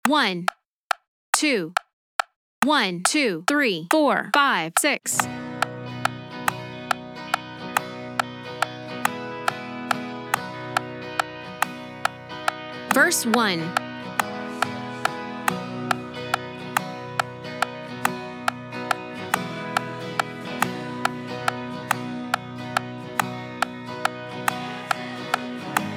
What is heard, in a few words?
tracks and guide and click